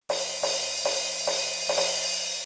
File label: instrumental